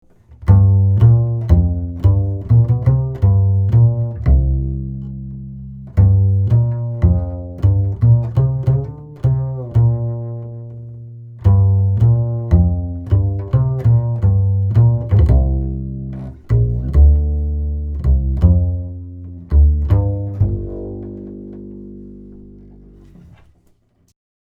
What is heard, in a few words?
Rupert Neve Designs Portico 5017 Upright Bass Km 184